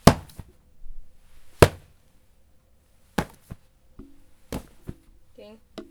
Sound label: falling Down